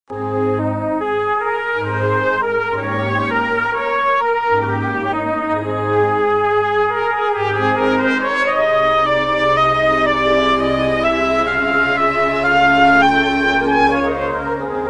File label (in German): Verzierungen Trompete; TrompKonz